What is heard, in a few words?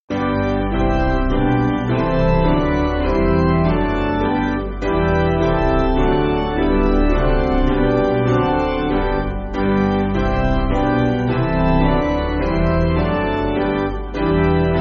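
Piano, Free Music Backing Files for Churches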